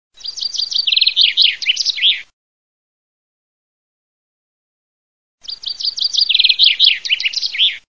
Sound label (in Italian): FRINGUELLO